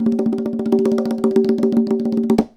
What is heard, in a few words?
Fill, Conga; musicradar